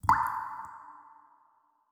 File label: Water Drop